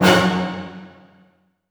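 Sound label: C major fortissimo, orchestra hits; Sound On Sound - Peter Siedleczek's Orchestra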